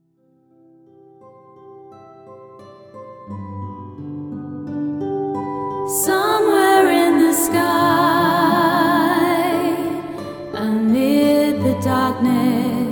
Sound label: lullaby